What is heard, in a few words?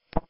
sweep 5s 500 5kHz; LaSpezia; 30V; Hilbert; CESI